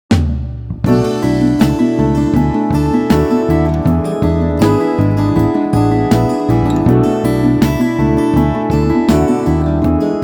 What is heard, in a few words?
INSTRUMENTAL